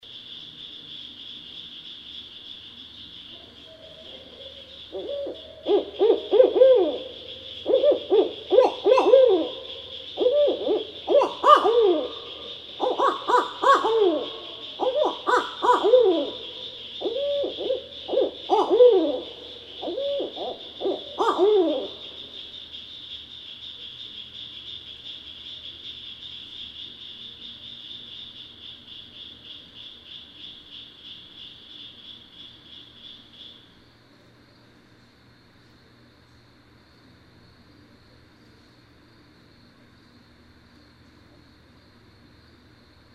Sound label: Barred Owl